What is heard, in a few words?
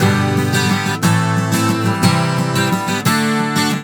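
120 G GUITAR, Celtic; USB Soundscan vol.42 - Celtic Flavours; AKAI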